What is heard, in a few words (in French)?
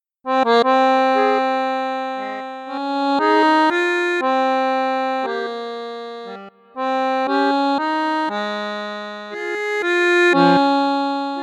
accordeon, registre 16; concerto bwv1056 largo; borsini bayan